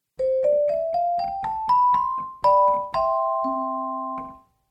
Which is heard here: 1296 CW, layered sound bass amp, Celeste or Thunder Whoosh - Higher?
Celeste